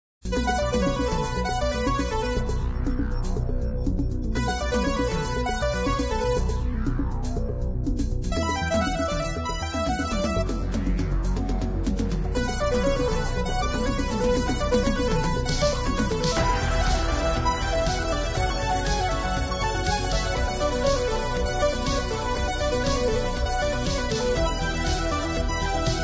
Keytar; The Usual Suspects

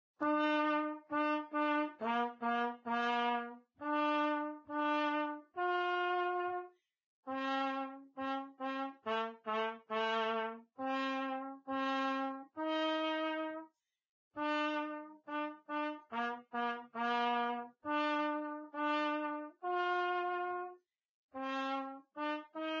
Trumpet; Zomboid; Lifestyle

